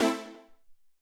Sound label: Tek Hit